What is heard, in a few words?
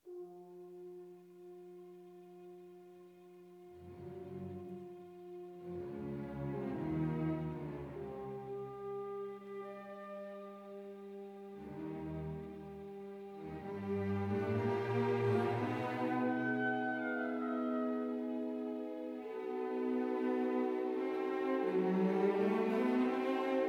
Symphony No. 8 in C minor; No. 1 movement (Bruckner); Legend of Galactic Heroes; Galactic Empire SIDE